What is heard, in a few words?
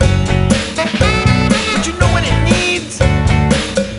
Cowbell; Happy Feet Two; NDS